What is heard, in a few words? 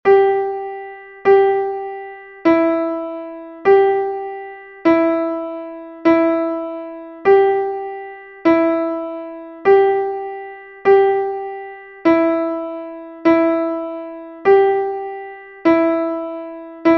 16 notes no rests